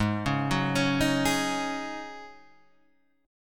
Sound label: G sharp 7th Suspended 4th, G#7sus4 chord; arpeggiated; 4,4,4,6,4,4; JGuitar